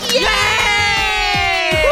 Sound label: yay; Hifumi and Doppo